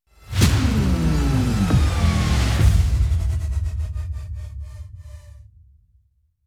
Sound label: Music Cool Down; Tokyo